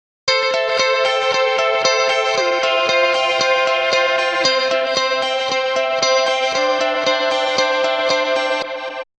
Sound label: guitar mixed